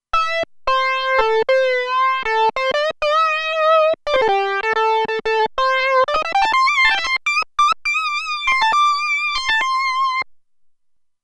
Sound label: Mellow Lead, Roland Alpha Juno-2; Factory 2